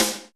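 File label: GOGO snare, GO GO DRUMS; Crypton - DrumBase5000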